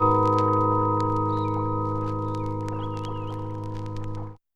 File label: Stab Keys Birds; One Shots; Ableton Live 12 Lite; Core Library